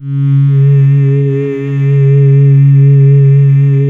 C#, Synclav FM; Big Fish Audio; SPACE1

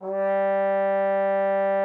TROMBONE, G3; Xsample VOL-3

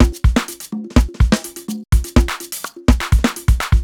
top extra, Beats, Nu Jazz House, 125

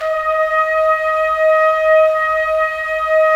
BRS TPS, Trumpets; Roland - LA Composer vol. 4

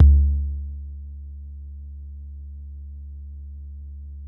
TALKING; Music Store Sound Service; Einstein

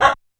C6 Dog, SFX Kit, Yamaha PSR-E323

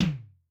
Hybrid L, Tom, Yamaha DTXpress-II

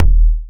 kicks; Frenchy; RoBKTA Sample Pack Supreme

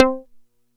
JUNO SEQ; E-MU Formula 4000 Series Vol. 3; Analog Odyssey

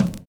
TOM FILL; Univers Sons

